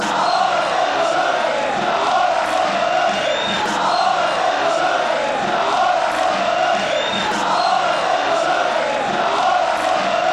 ahora, fan chants; granada

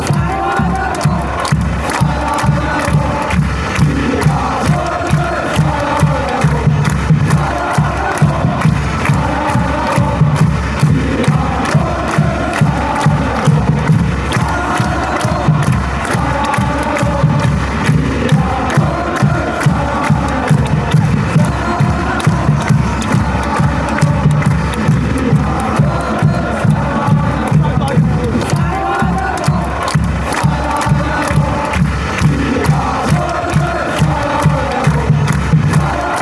denmark chant

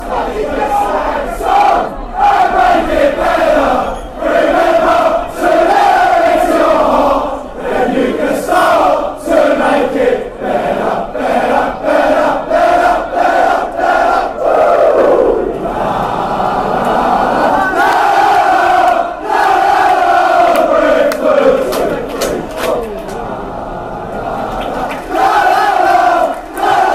hey fan chants; brentford

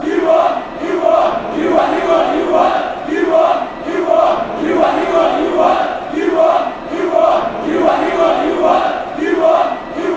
you what fanchants

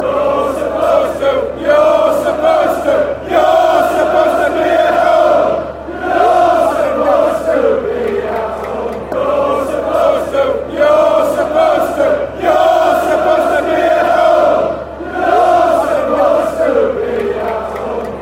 youre supposed be home hull fanchants

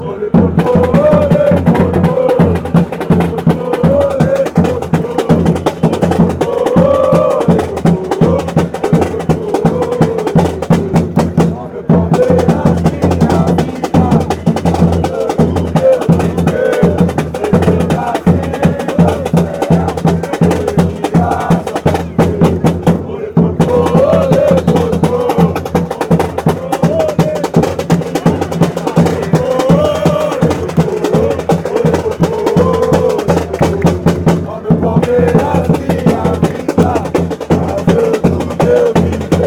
chant, fanchants; palmeiras

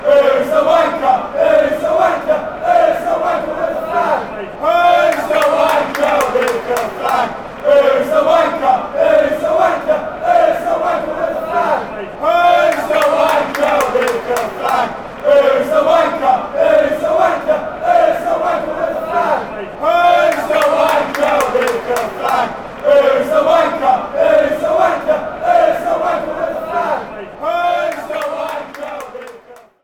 linesman song, fan chants; watford

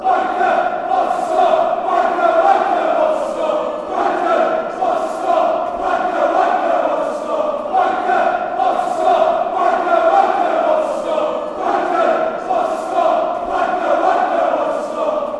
wnker whats score, fan chants

